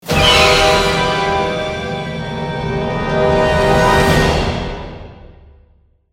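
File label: Music Stinger Snow Large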